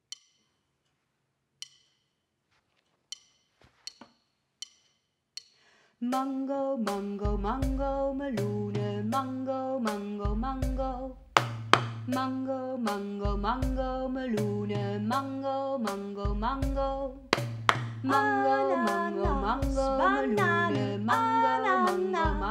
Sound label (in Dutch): Fruitlied zang